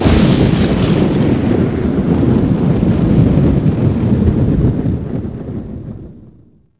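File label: thunder close; envrn; The Elder Scrolls III Morrowind GOTY; XBOX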